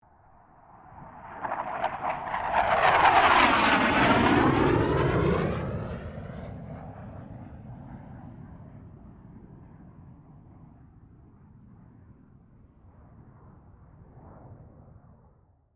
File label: AIRCRAFT CA-27 SABRE PASS STEREO